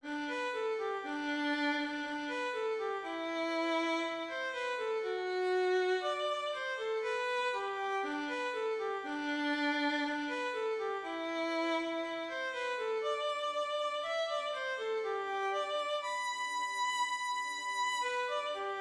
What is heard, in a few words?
jinglbell vn; 징글벨; violin